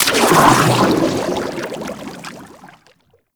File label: Boost Thruster, Vehicles; Warframe; Sharkwing